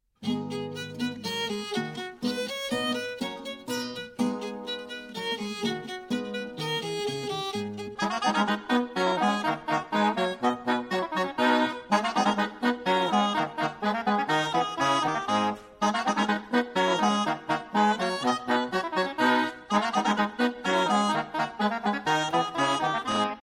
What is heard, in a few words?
Branle simple, danza